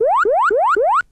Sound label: droplets; Zaps and Beeps